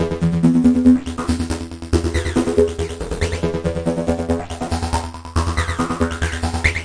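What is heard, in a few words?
buzzy bee sequence, 140 bpm; SYNTH AND ELECTRONIC LOOPS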